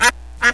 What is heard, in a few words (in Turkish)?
ördek vak; hayvanlar